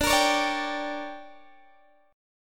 D Major 7th Suspended 4th Sharp 5th, Guitar DADGAD